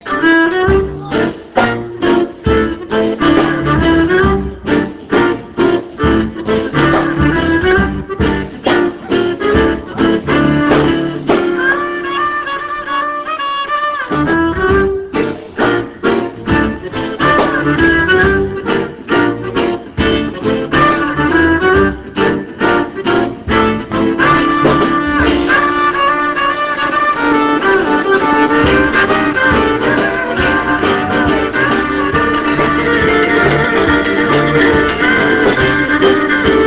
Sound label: jungle